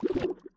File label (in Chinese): 幽匿充能声